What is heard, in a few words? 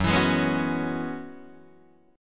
Gb 9th Suspended 4th Gb down Guitar DADGAD, chord